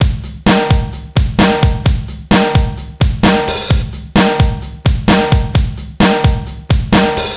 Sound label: Rock loop; Reference Beat